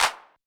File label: Yamaha DJX 2B, T8 Clap